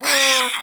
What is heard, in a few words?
Catbrow Feral Vocal Pain, Enemies; Warframe